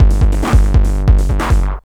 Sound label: PW 909 140, Hard & Loud Techno; USB Soundscan vol.01; AKAI